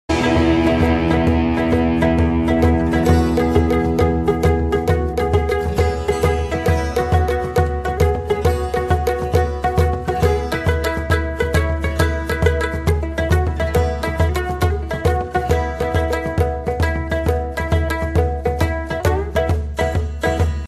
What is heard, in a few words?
unplugged ringtone; rock on 2